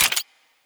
Weapon Foley

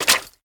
Footsteps Swamp; We Are The Dwarves

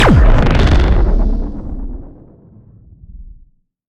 Shot Large Blaster Proton Burner; Robobeat

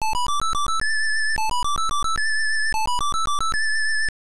Use Magic Ocarina; Willow; NES

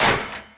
Slotmachine Slot Lock

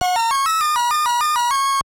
Lose Life; Aladdin

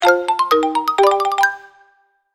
Lounge (Unlocked a Minigame); animal crossing amiibo festival

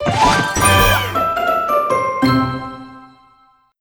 Crunchy Finish

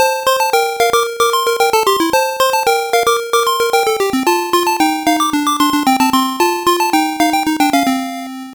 Windy (Match Start); Champion Tennis; SG-1000

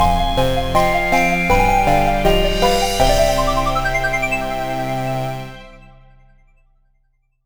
Bgm Gameover

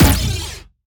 Sciweap Shot Small Blaster Energy Thrower

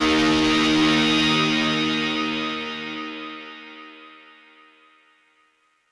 Oneshot Guitar; zombie army 4 dead war ps4 stadia switch windows xbox one gamerip 2020

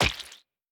Hit Bug; we are the dwarves gamerip 2016

